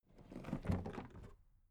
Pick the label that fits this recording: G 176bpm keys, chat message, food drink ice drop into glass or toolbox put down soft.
toolbox put down soft